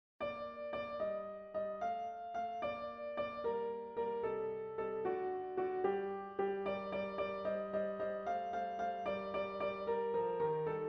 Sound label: Tarantella